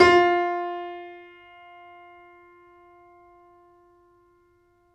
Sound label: FF F 6, Grand Piano off mic